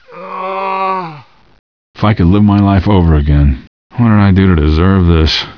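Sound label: prisoner moan; ambience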